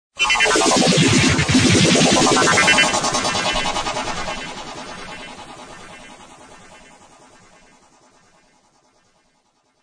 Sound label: sound effects keyboard; stone love